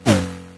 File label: whoosh; There; ThereClient; bacio